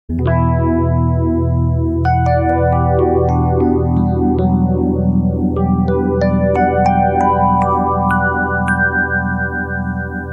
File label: polyphase; mit optisch gesteuerten Filtern, der auch anschlagabhängig arbeiten kann; Netzbetrieb